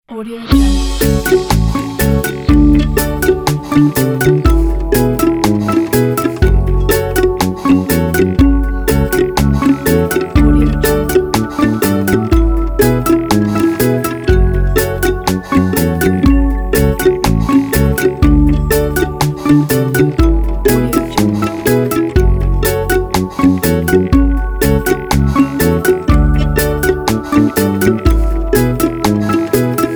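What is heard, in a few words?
reggae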